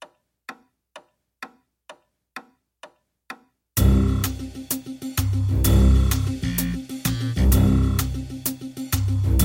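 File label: background music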